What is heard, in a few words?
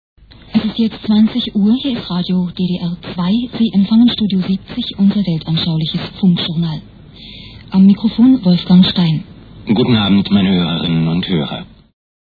interval signal; radio ddr2 1975